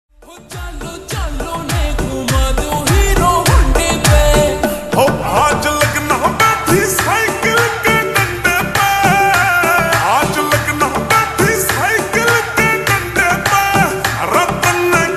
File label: Slowed Reverb Ringtone